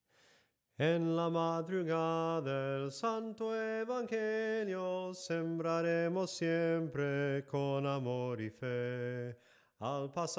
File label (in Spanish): SOPRANO CANTADO, himnos en melodia soprano; cantos del camino